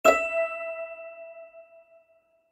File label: Pizzicato String, Pizzicato String Glockenspiel Accent Light; Musical Sound Design Sound Effects; Blastwave